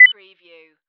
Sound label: pro beep